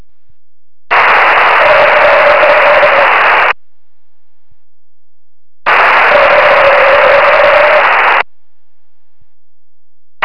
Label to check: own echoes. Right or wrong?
Right